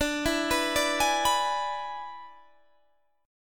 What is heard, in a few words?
E 7th D, guitar